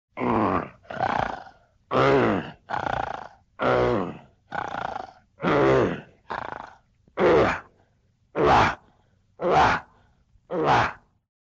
monster low grunts and snorting noises

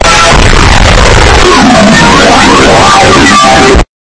hardest bass ever

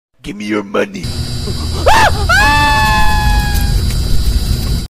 baby aaaaaaaah

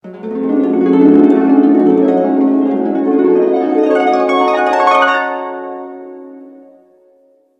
mystical harp

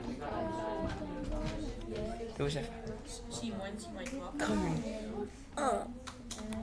donky ahh

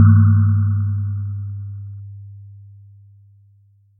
boop

